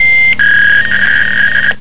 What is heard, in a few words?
fax